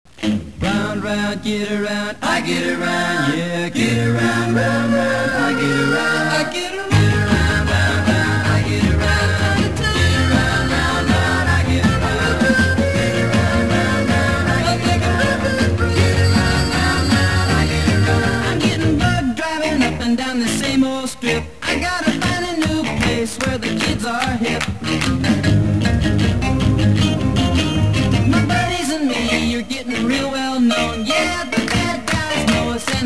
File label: music